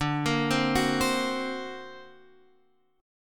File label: D Augmented 7th, Guitar, Drop D